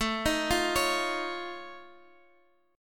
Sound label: F Augmented 7th A, chord